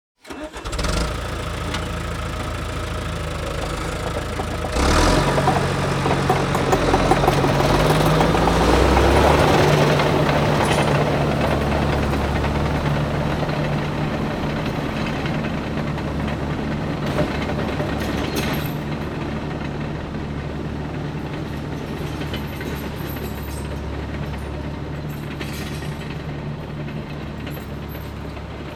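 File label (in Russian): бульдозер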